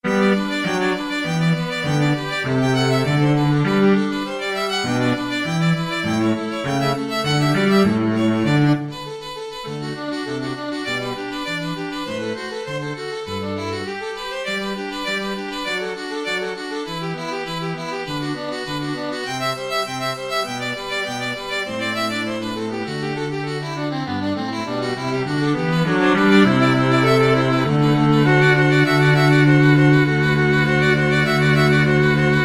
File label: string trio